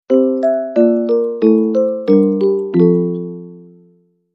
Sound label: Musical Box